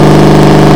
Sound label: stingray on low